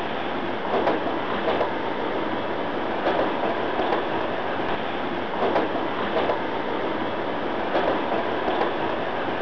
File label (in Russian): kolesa, vehicle